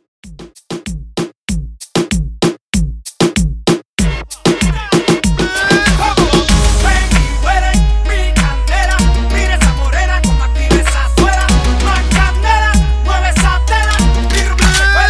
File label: Reggaeton 96 Bpm